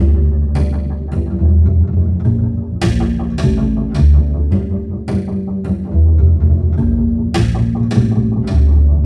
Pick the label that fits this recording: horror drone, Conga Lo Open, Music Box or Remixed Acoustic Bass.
Remixed Acoustic Bass